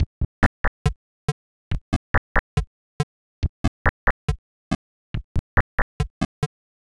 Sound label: Blip Gameboy